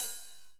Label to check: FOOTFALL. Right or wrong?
Wrong